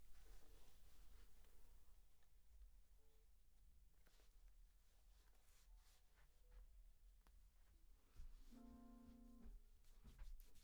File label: Ld VOX SM7